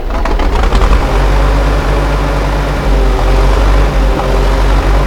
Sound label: crane turn loop; hl2